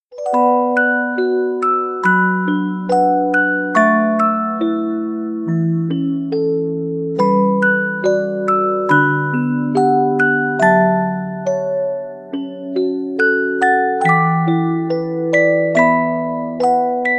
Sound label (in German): Spieluhr